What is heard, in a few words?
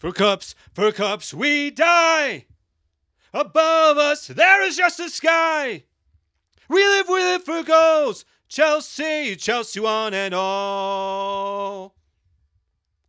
chelsea football club vocal